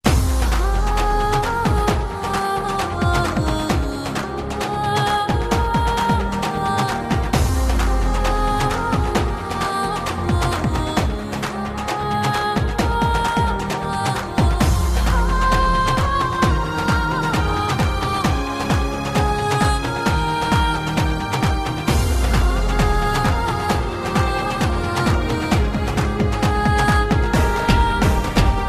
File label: Instrum